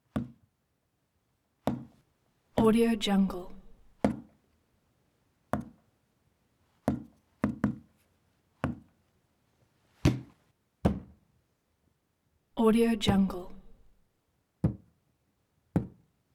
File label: tap synthesizer case hits various versions